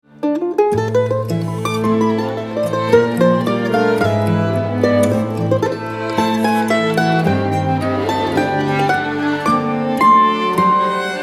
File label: Movement 2 Polska Larghetto